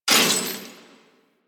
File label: Melee Heavy Air Slam Impact Metal; Weapons; Whooshes; Warframe